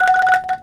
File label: F Bandung Angklung Vib